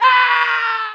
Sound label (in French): Cri d'une chute